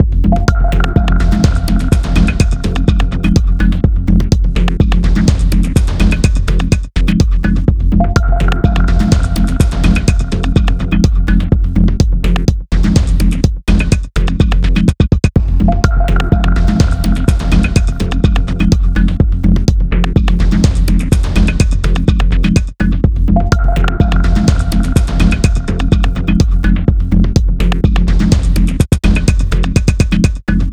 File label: Battle Loop; mad crown